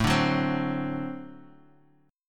D 7th, A

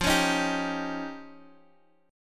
F 7th Suspended 2nd Sharp 5th, Guitar Open D; x,8,11,9,10,x

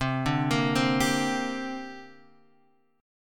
C 7th Suspended 2nd, Guitar, Half Step Down; C7sus2

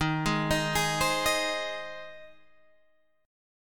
C Minor Sharp 5th Eb

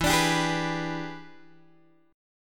E 7th Sharp 9th Guitar Open G, chord

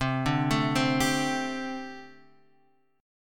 C 6th Suspended 2nd C

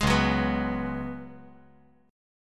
C sharp 7th Suspended 4th C sharp

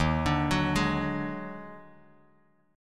Eb Minor Major 7th Double Flat 5th, Bass Standard; JGuitar

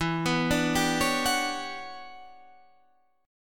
E Minor 13th guitar chord, Half Step Down

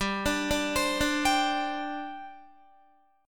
G Suspended 4th, Guitar; Gsus4 arpeggiated; 14,17,14,17,14,15

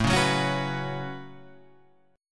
A 6th Add 9th A down Guitar Half Step Down

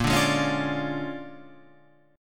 Bb 7th Sharp 9th Bb, Guitar Open F; Bb7#9 strummed

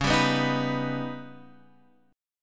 Eb Augmented 7th Db

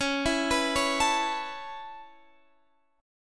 C sharp Minor 7th Sharp 5th, Guitar Standard; C#m7#5; arpeggiated; x,16,14,16,14,17; down; capo=9

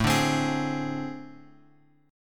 A Minor Major 7th Double Flat 5th; AmM7bb5 Chord {5 5 X 5 X 4} strummed

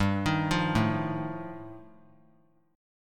G 7th Suspended 2nd Sharp 5th G chord; arpeggiated; JGuitar

